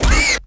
hit pig; animal; Tony Hawk's Project 8; XBOX

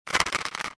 scuttle, mine

